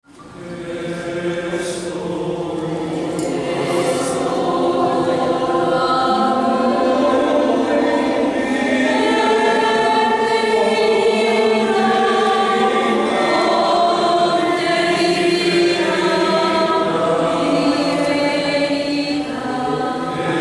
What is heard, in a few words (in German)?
Zweistimmiger Kanon; auf die textworde Te solo adoro mente infinita fonte di vita di verita